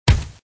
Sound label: Thud